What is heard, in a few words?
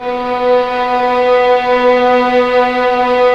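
violins; String Master Series; Vlns 6 p-mf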